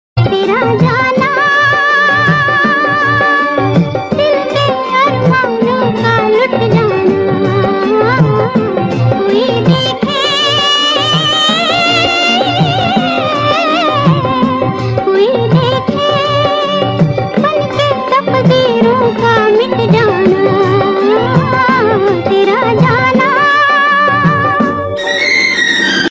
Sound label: hindi old song; Prem Pujari